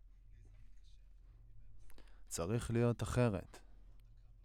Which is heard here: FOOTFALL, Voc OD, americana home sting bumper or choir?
Voc OD